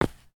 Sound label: stone, steps; update stone step sounds; VoxelEngine; stone 3